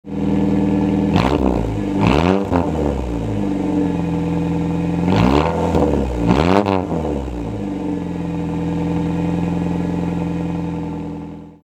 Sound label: ding